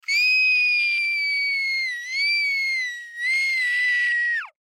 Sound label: Human Scream; Scream Painful High Pitched Male Ten Yrs Boy; Screaming